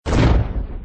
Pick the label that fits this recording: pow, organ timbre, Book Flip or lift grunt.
pow